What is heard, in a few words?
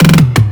tom roll; Drum One Shots; Slate; SD MIDNIGHT Sample Pack